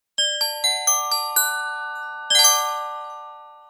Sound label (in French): Melodie Xylophone court